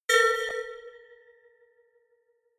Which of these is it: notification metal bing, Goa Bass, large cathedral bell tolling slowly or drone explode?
notification metal bing